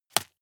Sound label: juggle catch hard